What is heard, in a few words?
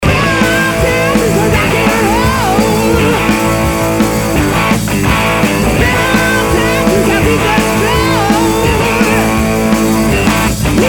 Rock Roll Extract